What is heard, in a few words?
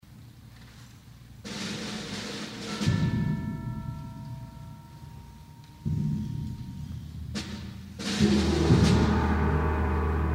Taps; 4th of July 2017